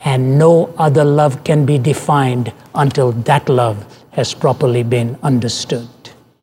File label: Vocal Phrases; Vengeance Samples; Vocals